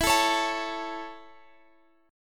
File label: C sharp Minor Sharp 5th E, chord